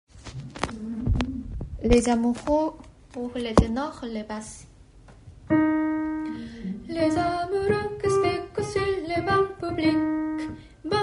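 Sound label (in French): les amoureux tenor et basse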